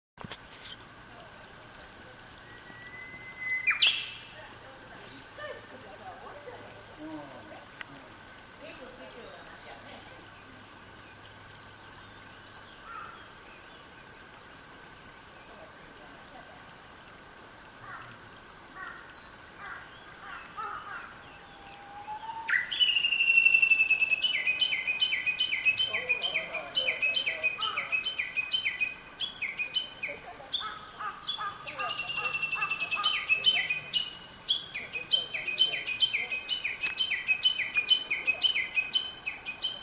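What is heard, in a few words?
uguisu, Japanese bush warbler; keitozan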